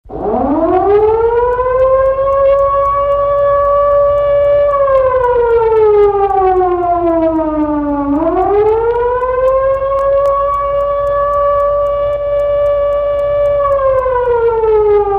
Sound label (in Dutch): Sirene; Sound Effects Deel 2